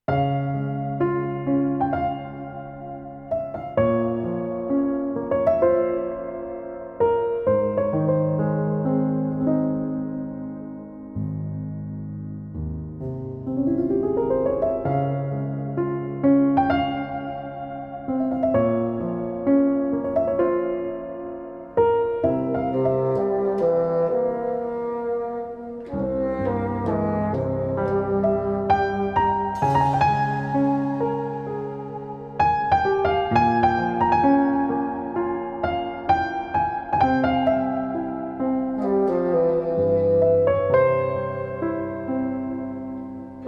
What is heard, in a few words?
Hymn of Cicadas; genshin impact the unfathomable sand dunes 2023